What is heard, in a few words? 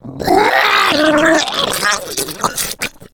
female boomer vomit; voice